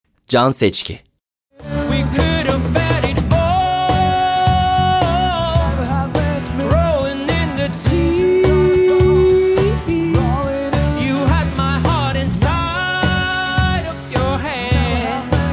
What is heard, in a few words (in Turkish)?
vokal